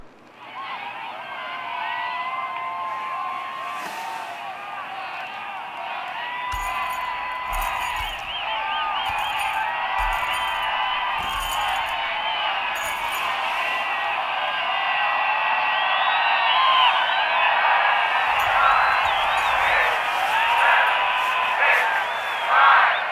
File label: Hug; Kalymos; Warframe; Nineteen Ninety Nine; Quests; Romance NYE